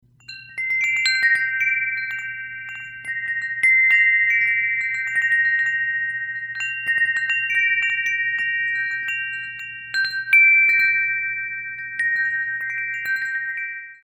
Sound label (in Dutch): Windgong Costal glas Vis 55cm; Woodstock Chimes; Dit model windgong is een erg sprankelend en fantasierijk model.